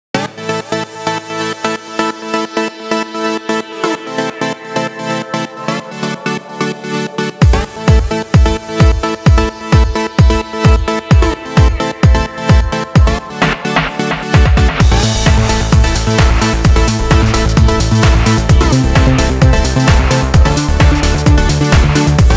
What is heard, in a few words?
Trance